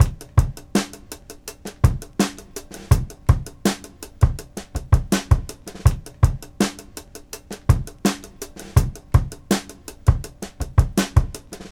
82 bpm drum beat e key, Free breakbeat sample - kick tuned to the E note. Loudest frequency: 1011Hz